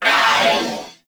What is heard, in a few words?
Purgatory Warrior Death; Warframe